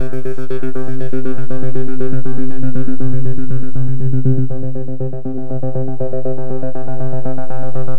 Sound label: philter (sweep); Sonic Foundry (Sony Creative Software); Ugly Remnants VOL-2